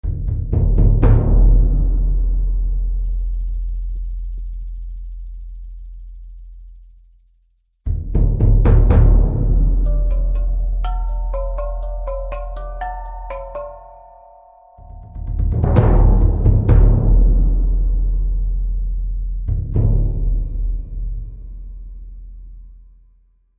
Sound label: combat music stage 2; Might and Mayhem